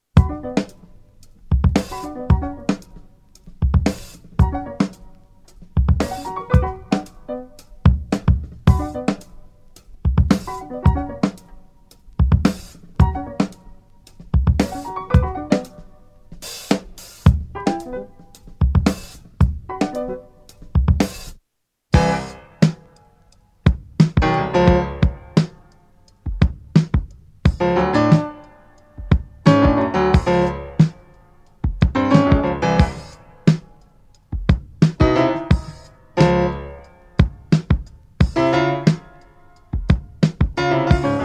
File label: hip hop soundalike breakbeat with drums + piano